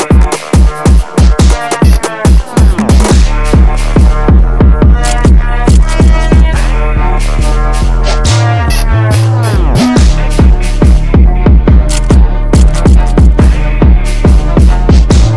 Jersey Club Type NY Intrumental

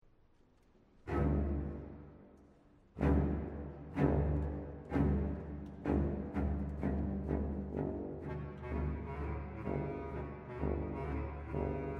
Harp Concerto; II. Energico

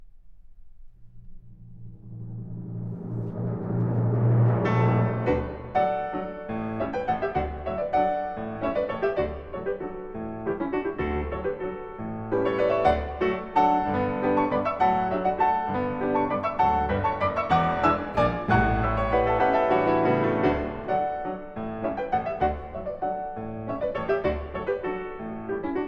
Piano Concerto, Orchestra; Piano Concerto in D major Op 27 III Allegro Tempo di Valse

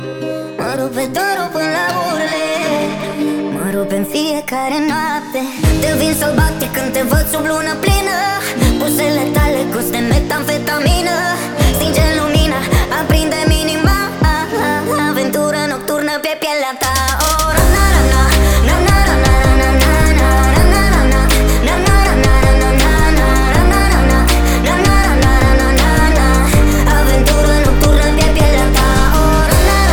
Sped Up

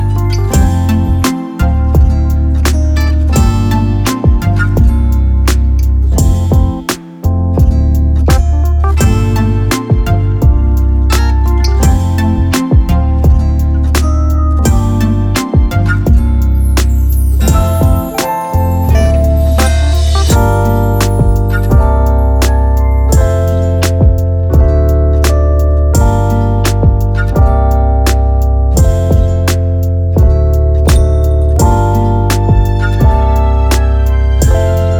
Instrumental

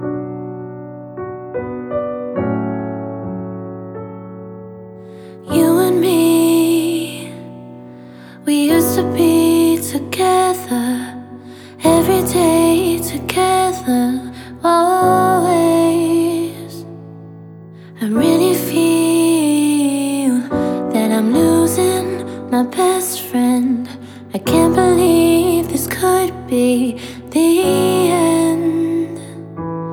Acoustic

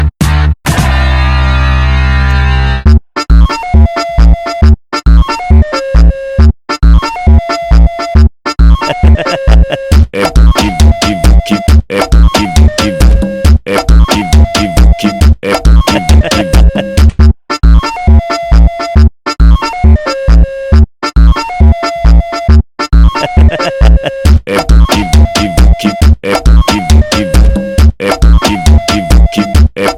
Brazilian Song Funk Brasiliero Funk

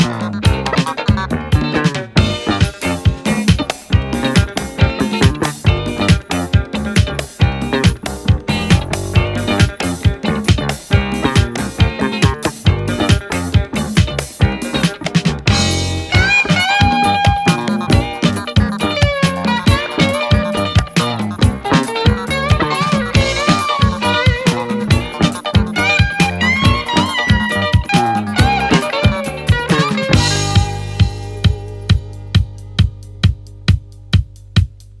Instrumental

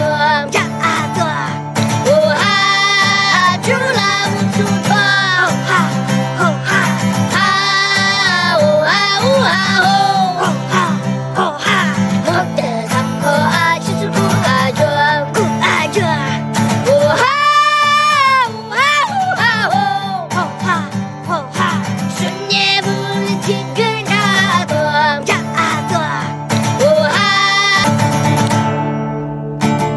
3 Little Guys Singing

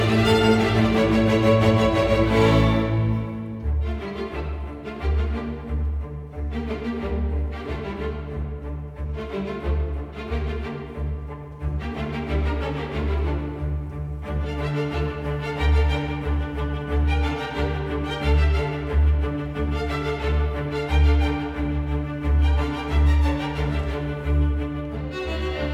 for Strings Orchestra

